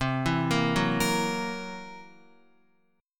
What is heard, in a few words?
C 7th Flat 5th C down Guitar; C7b5 arpeggiated; C7b5 chord; JGuitar